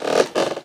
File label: floor creak